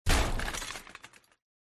crash, vehicles

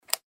plugin

